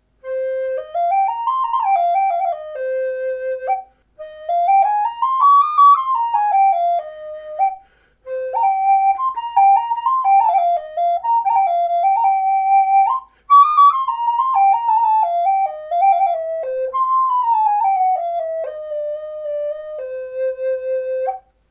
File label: C5 wipe on poly